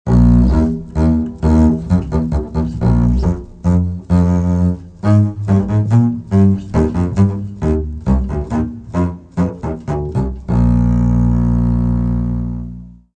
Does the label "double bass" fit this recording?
Yes